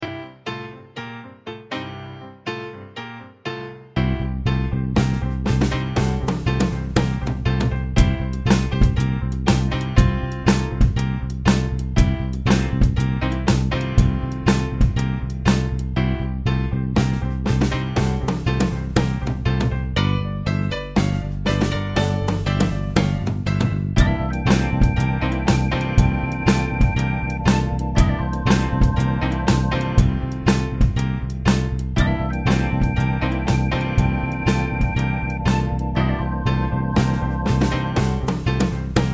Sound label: Blues